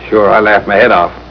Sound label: Laugh Head Off